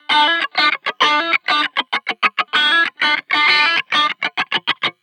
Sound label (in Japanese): カッティングギター フェイザー